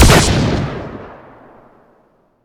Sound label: gauss shoot, weapons; Boomsticks and Sharpsticks